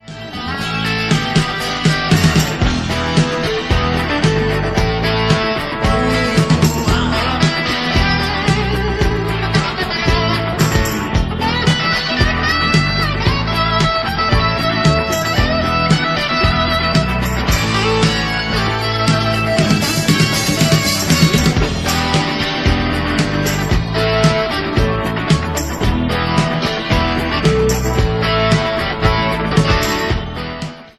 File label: Steinberger gl2, live; San Antonio 85